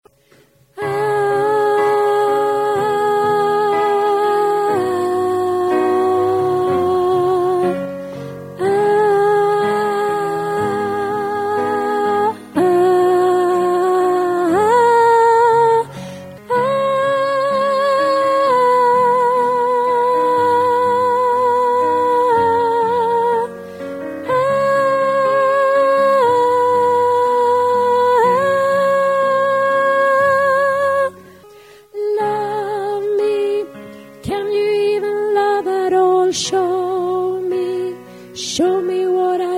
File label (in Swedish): sopran